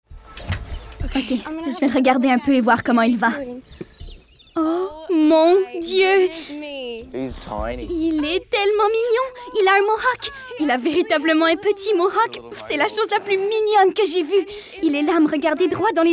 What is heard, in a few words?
Wild Adult Emus; Surimpression